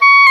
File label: OBOE, Orchestral Winds, Dry; Roland LCDP04; Oboe 4-8